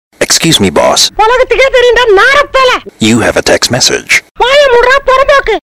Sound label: Tamil Message